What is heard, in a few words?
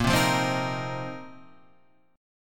A sharp Suspended 2nd Suspended 4th down